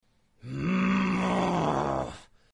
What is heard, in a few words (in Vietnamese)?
Am thanh tuc gian